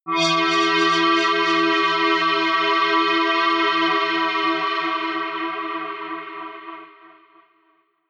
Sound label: atmospheric waves high, drones